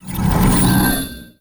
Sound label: Games Main Menu Out; XDK4627 BIOS and XDK Launcher and Dashboard(for Retail); ogxbox